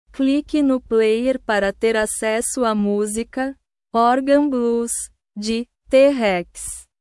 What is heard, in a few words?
organ blues